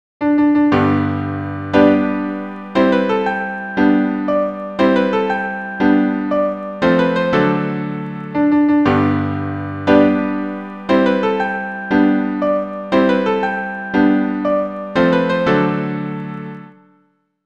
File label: piano arrangement